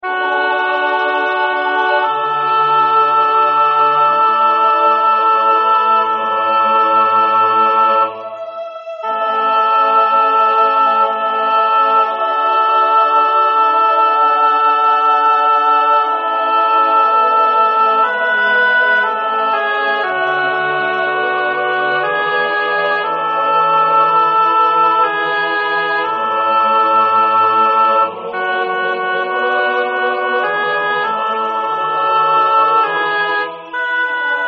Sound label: Sanctus ALT; Zelenka; Missa Paschalis